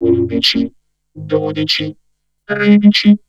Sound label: Vocode; Choir